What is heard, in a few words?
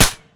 shot without echo, weapons, usp; garrysmod; cw ber usp